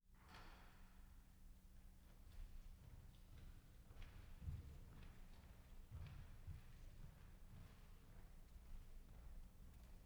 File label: Blumlein L; chamber96